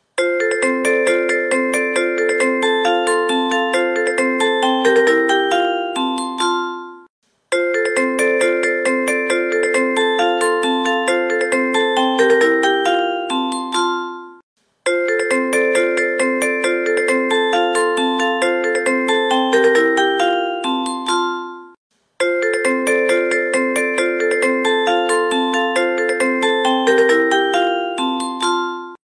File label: departure melody